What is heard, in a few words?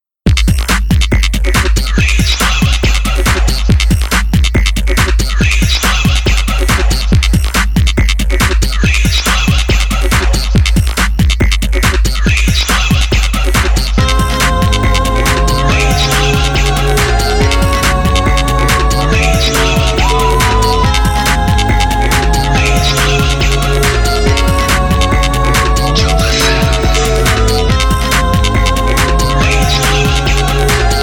cyber; maoudamashii